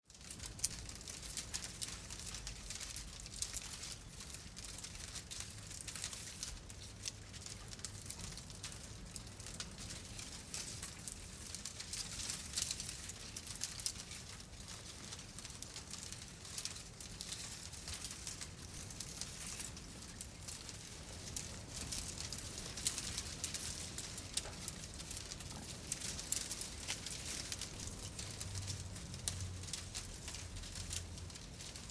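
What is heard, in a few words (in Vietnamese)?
Âm thanh khi đám cháy đã được dập tắt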